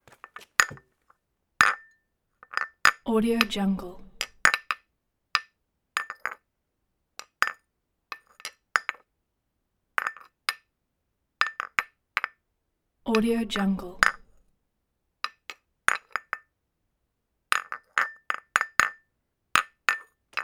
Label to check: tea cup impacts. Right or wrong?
Right